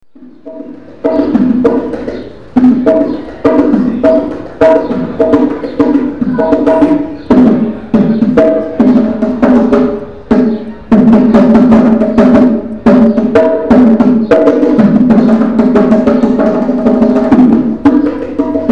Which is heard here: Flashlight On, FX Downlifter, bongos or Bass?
bongos